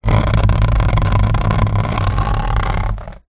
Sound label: plastic washing basket tub drag on concrete ground; foley